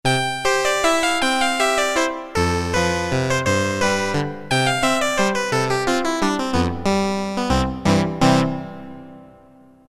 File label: Harpsi, Roland MT-32